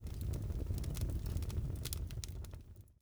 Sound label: Vine Fire